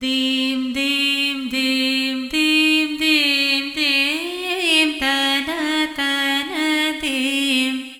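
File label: 60 C Female Tarana Fx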